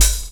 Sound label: DR. LOOP; X-Static Goldmine 3